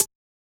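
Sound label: Hi-Hat Closed; Yamaha DJX-2B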